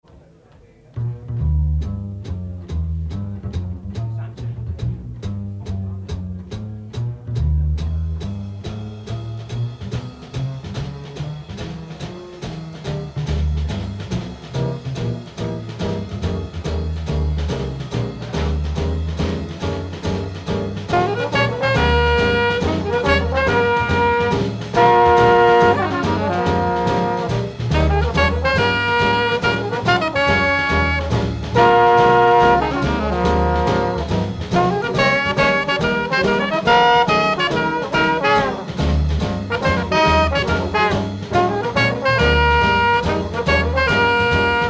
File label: blue funk